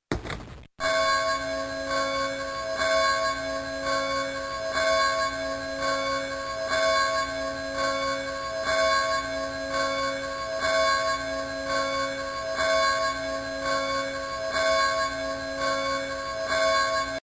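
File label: Church Bells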